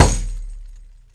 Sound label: DOIRA, ETHNIC PERC; Instruments & Percussions; InVision Interactive; Lightware VOL-1